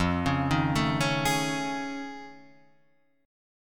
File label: F 7th Sharp 5th Sharp 9th F; F7#5#9 arpeggiated